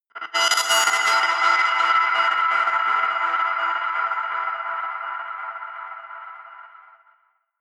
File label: stutter breach high, drones